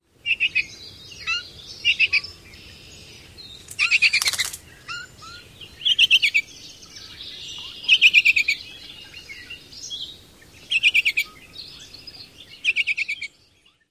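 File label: Baltimore Oriole encounter calls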